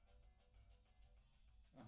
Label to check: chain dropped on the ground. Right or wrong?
Wrong